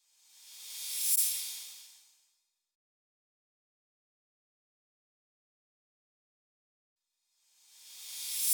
drum loop 130 BPM cymbal; Loop Stems; Cymatics; DOPE DRUMS VOL. 1; Hunted Drum Loop

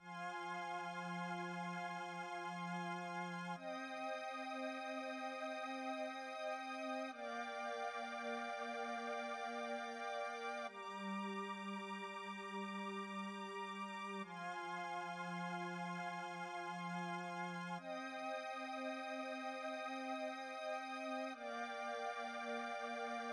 135 BPM C Min Pad, Hip Hop; Melody Loops; Loop Stems; Cymatics; She Goes

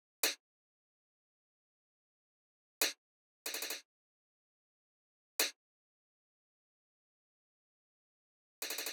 Drum Loop 93 BPM Percussion, Hip Hop; Drum Loops; Loop Stems; Cymatics; Manana Drum Loop

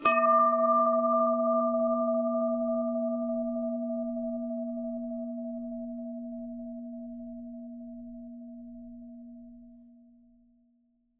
Bell Clang Resonance Low; Fairlight Sound Lib

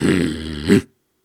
Snarls and Hums, Viking Vocal

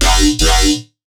Riddim Bass Hit F; Insekt

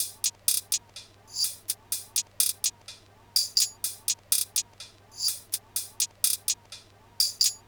Smack Perc Loop - 125 BPM; Drum Loops

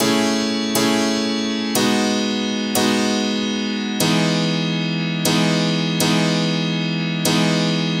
Loop Atmosphere 120 A Piano, Deep House; Loops; Ghosthack; Advent Calendar 2019

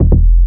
Bassline F Footwork

